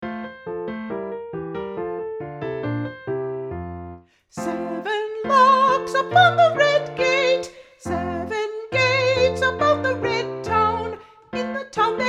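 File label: Soprano; The Handsome Butcher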